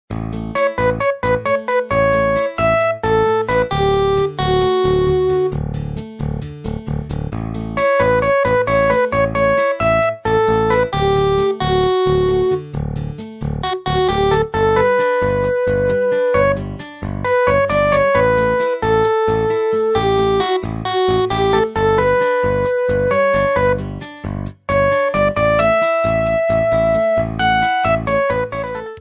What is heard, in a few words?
ringtone